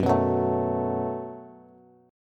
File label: F sharp Minor Double Flat 5th F sharp, Bass; strummed